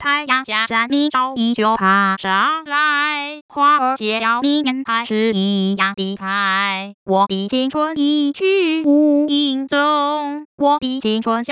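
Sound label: Young Dancing, Mandarin Singing Voice Synthesis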